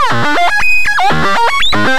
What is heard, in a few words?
Synth Sequence; Eclectic Electronica